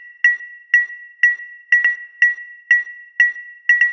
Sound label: Glass Bottle, 122 BPM; mmb studio melodic